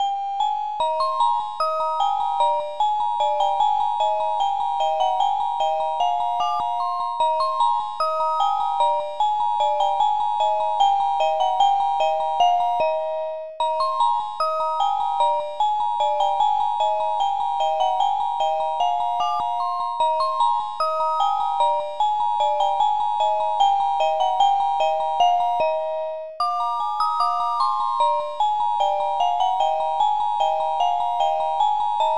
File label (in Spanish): música